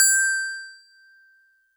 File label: StarPiano DX100 G7